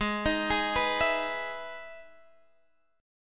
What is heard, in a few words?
E Major 7th G sharp, chord